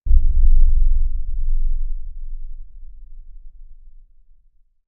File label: Bass Impact Low Drum; impacts